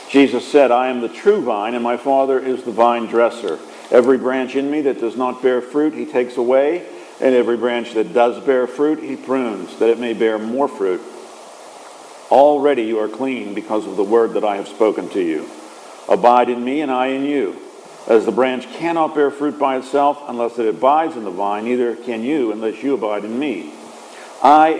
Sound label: Gospel